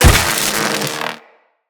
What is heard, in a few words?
Electro Prod Hit Flesh; Weapons